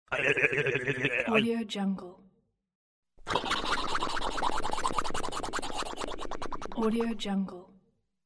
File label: cartoon shake mouth